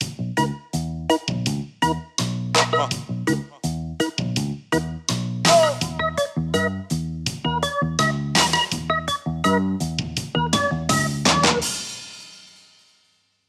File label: Reggae, ringtone